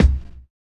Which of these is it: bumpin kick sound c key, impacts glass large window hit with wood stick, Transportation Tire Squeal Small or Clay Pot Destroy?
bumpin kick sound c key